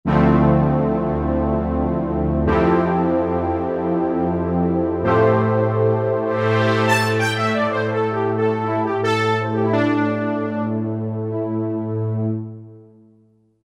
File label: Brass; Roland SRX-03 Studio SRX